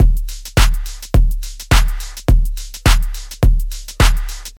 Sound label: hard pop big kick loop; drum loops